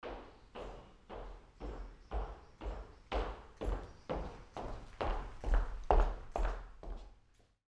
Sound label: steps on parquet floor